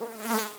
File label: flies